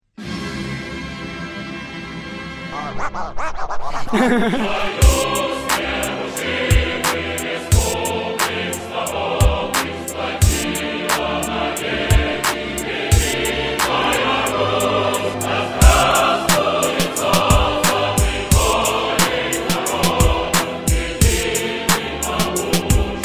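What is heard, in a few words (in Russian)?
гимн СССР хип хоп версия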